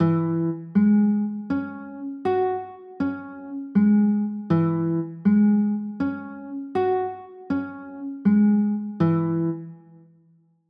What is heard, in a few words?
f sharp 9